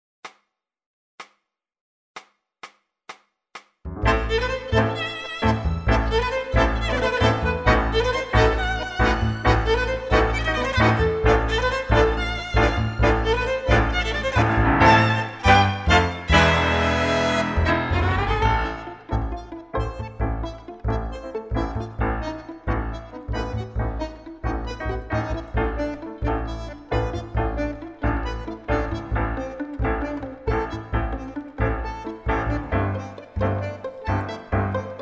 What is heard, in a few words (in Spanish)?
Am con flute